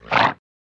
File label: Horse Snort Generic